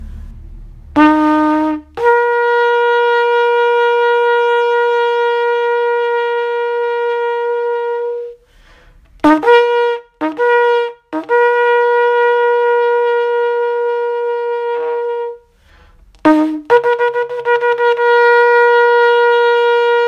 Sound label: Shofar